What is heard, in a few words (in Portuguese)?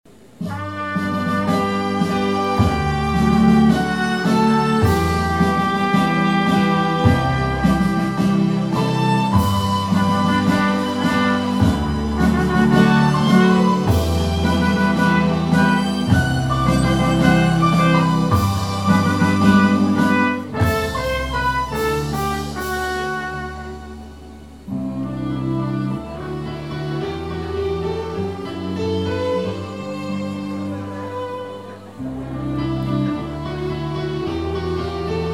Piano e Cordas